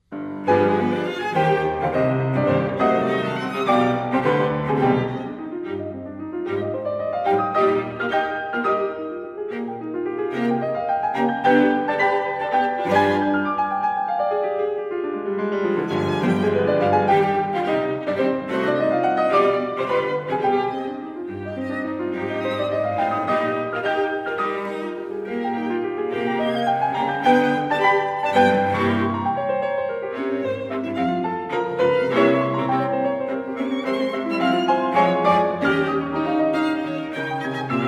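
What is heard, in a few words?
piano quartet; kirchner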